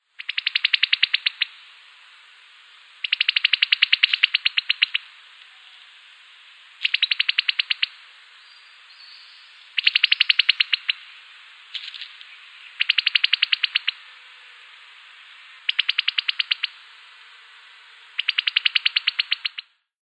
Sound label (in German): Haselhenne, federwild; Haselhuhn Gesang